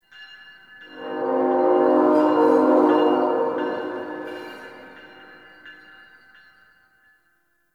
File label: Distant Train C; Warframe; Ambience; NineteenNinetyNine